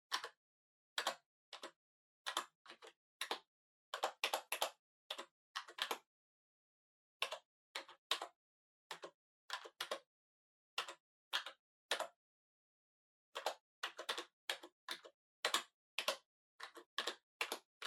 PC Keyboard Far Gentle

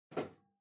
Portable Stereo Far Put

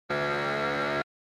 Quiz Buzzer Long

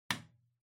Light Switch Box Rocker Wall Mid

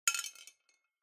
Drawing Machine Garapon, Drop

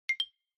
Xylophone Multi

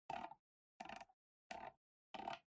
PC Mouse Far Scroll

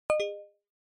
OS Sound Notification

